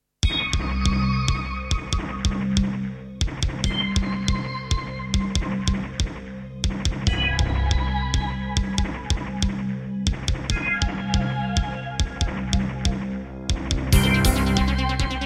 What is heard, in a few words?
Creative Sound Blaster AWE32; sigil doom episode 5